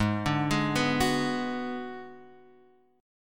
G sharp Minor 7th Flat 5th G sharp down Guitar Half Step Down